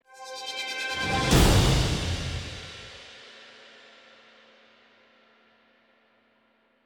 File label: Combat Stinger